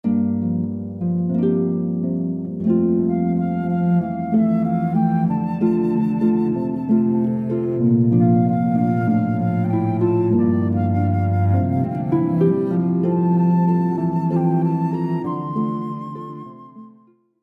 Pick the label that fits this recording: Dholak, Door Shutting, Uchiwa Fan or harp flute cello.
harp flute cello